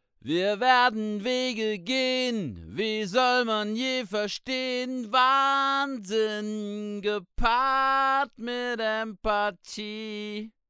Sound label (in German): WA 47jr Vox Acht